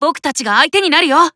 Warcry

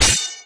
No Damage; Se No Damage

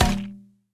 Weapon Metal

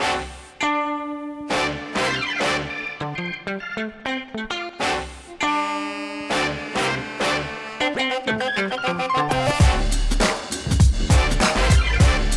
Bronx Block Party Instrumental; UPM

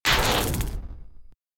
Redlightning Zap

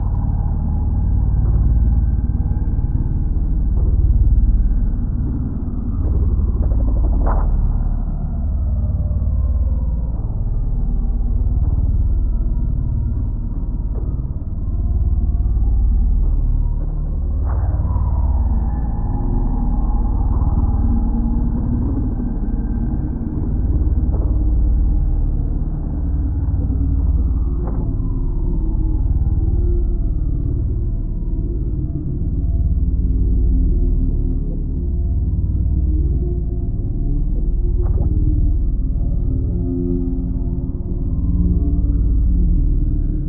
Preston Hotel - Fight Ambience

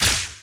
Laystormlaser Hit; gamerip

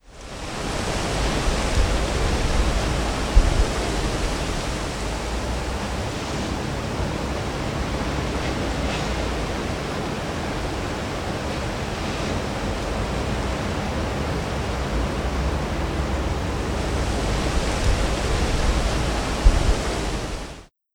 Cities nyc Wind

